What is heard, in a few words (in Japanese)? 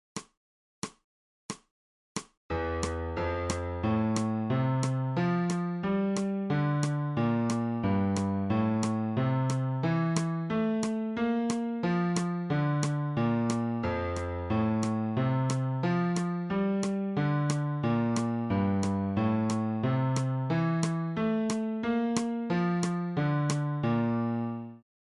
新フレットレス